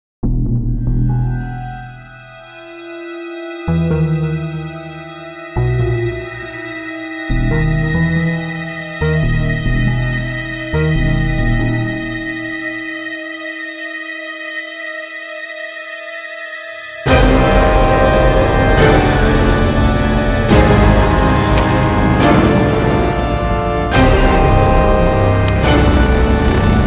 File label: Dobro FX